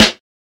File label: small reverb snare drum d key; snare drum samples